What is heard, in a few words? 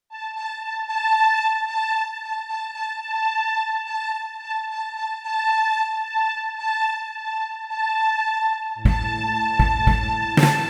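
accompaniment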